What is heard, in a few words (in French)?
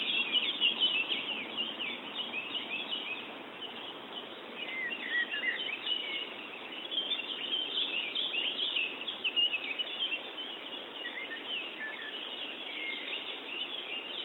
ambiance sonore pic mar; Montmaurin